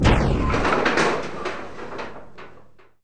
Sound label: open